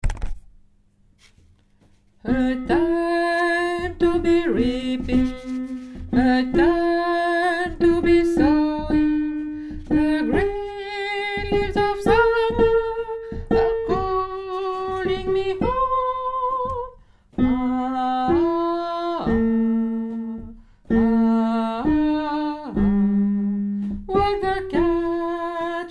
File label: the green leaves basse